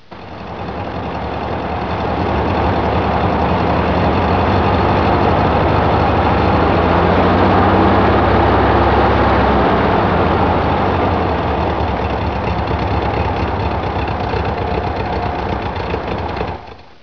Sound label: low rpm miss; Muir